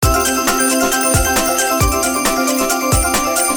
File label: count up, slot; Hot 40